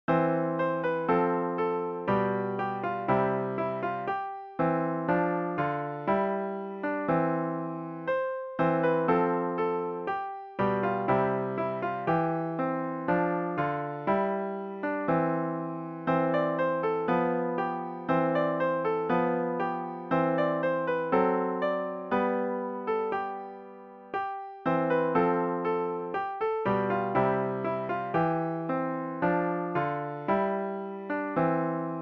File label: piano